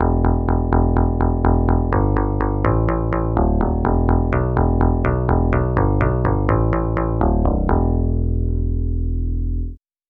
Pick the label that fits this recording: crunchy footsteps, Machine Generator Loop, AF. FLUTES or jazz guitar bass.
jazz guitar bass